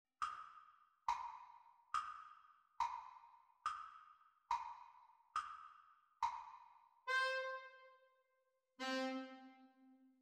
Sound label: Sax Duets Playalong; Practice Speed